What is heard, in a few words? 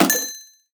Snare Crack Ring Layered, drums